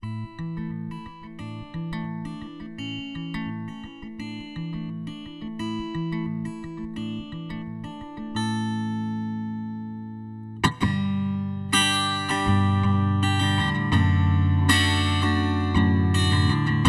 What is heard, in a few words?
acoustic